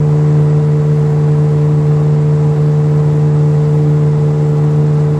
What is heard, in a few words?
50 hp Evinrude Boat Drive Loop High Speed, On Board